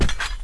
warrior Foot; Seidwarrior; Seer; GamigoNA

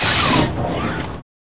Flask

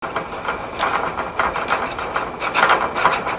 Windmill

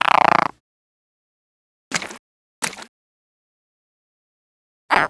Frog idle; Seer; GamigoNA

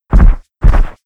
Windy Giant Run; Seer; GamigoNA

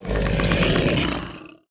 Jewel Keeper Idle; Seer; GamigoNA

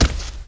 shadow dragon walk

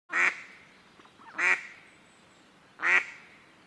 Duck

